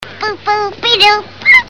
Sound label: Boop-Oop-E-Doop, Ha; Betty Boop